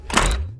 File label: slider off; p00gie